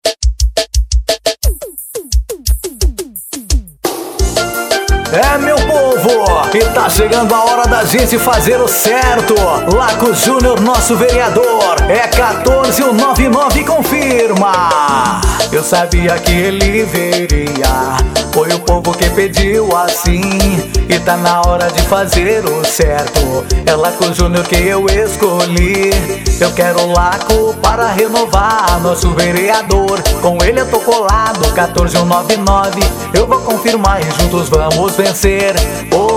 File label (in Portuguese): jingle politico, piseiro; parodia pisadinha